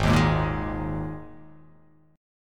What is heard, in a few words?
G sharp Suspended 2nd, G sharp, down